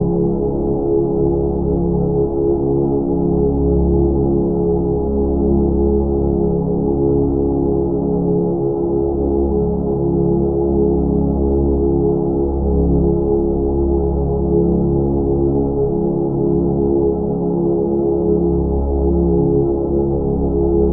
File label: Ethereal Mystic Alien Soundscape